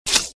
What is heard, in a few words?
Sfx Assassin Attack Hit; Mighty Knight 2